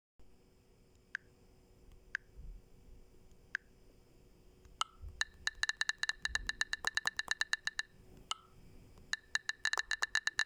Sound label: typing huawei sound